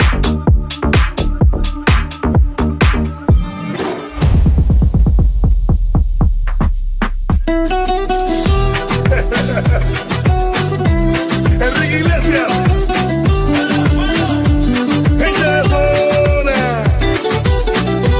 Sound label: Mash Up Basshouse